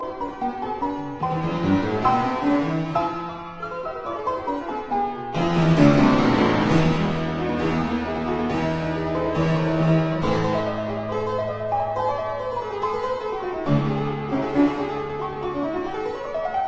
Keyboard Grande Sonata in C major, Allegro con fuoco; Op 16